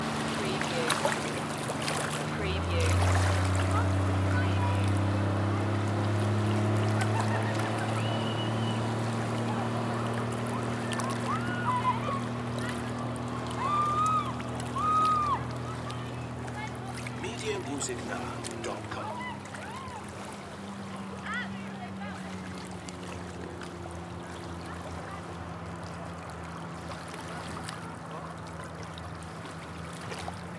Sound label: Windermere lakeside motor boat; beanstalk audio